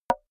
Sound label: wood board knock